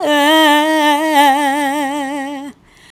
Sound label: Female Vocal